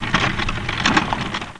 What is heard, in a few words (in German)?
karren; Anno1602